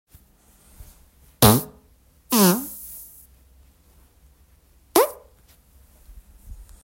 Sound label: fart